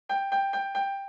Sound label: Warning; Humanitarian Helicopter; wii u gamerip 2016